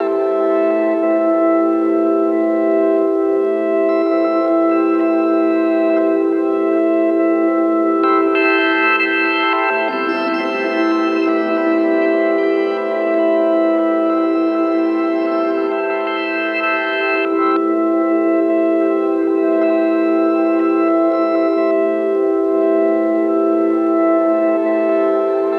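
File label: pad loop frozen rhodes 150 A minor; Virtual Riot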